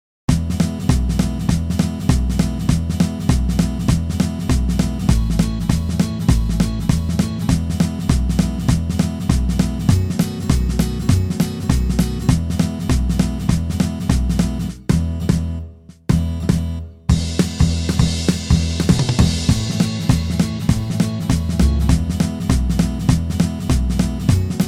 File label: Twangy Guitar